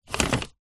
vehicles car fan mode dial turn toyota rav4

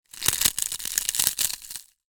food potato chips crisps crush; zapsplat

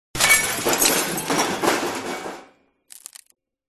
crashes junk various; Audio Mangler

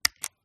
fuji camera shutter release button click press; mkh8040; pmsfx

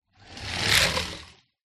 leisure toy car pass by wood floor

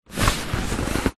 chair leather body movement; office

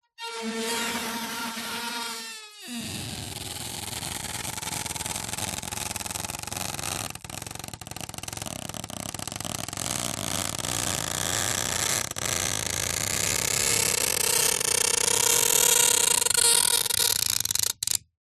foley plastic tape rub squeak creak long; zapsplat

